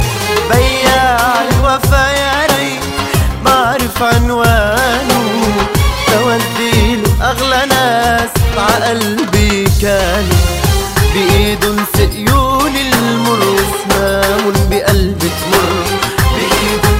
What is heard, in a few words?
Arabic Tone